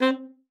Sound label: C4, ALTOSAX; Xsample VOL-2